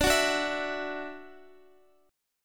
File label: D-Major Add 9th D down Guitar; Dadd9; strummed